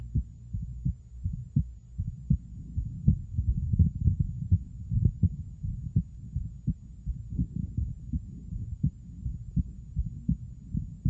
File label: Bicuspid aortic valve